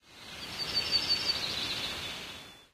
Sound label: forest bird, ambient; Source Engine; tf